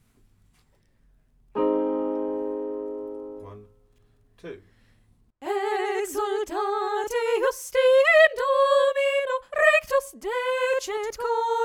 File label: soprano; exsultate